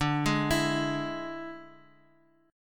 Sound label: D Suspended 2nd Flat 5th D, Guitar; arpeggiated